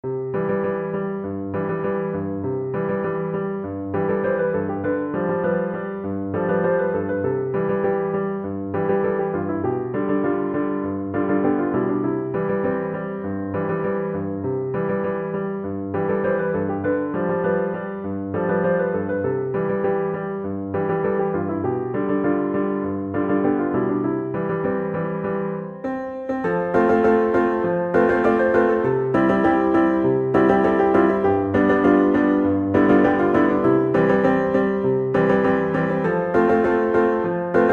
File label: neopolitan, piano